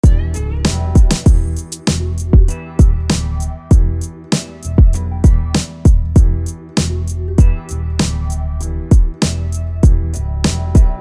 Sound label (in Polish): gitara chill próbka